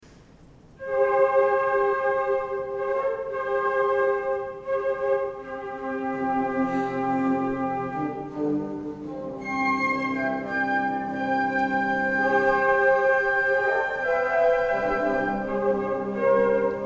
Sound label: concert, flute; THE LION KING; arietta-flute